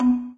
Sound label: windows xp ding; operating systems